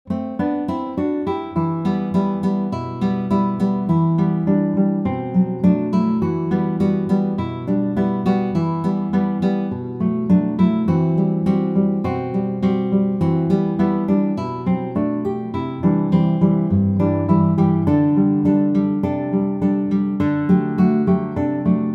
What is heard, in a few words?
Five Fingers Solo, guitar